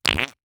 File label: Fart Thin Shy